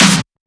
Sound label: SnareClap; FLPRO